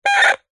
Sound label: cb radio walkie talkie crackle beep button; technology; zapsplat